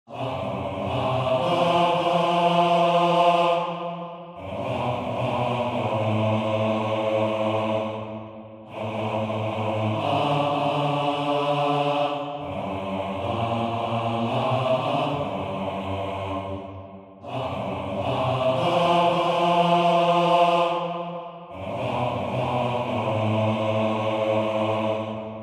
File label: Bass